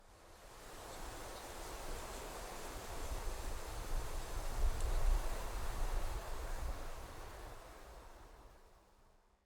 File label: Wind Blowing Through Trees; forest jungle ambience